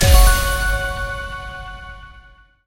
medium Win; parthenon

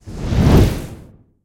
bird Symbol Rotates; hiddencoinsofegypt

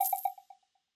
bet change; starburstxxxtremeusa

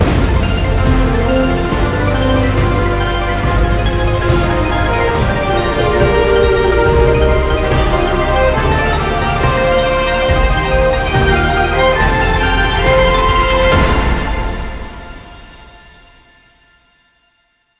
big Win

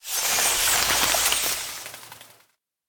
bats Flap

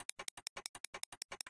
reel spin Loop; cashnoire

